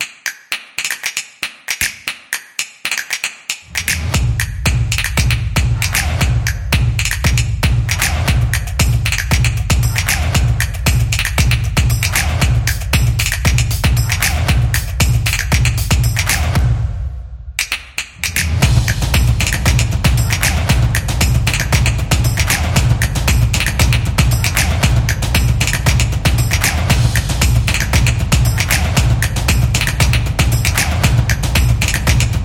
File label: Drums Dynamic